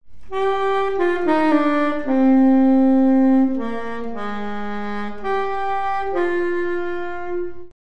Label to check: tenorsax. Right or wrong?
Right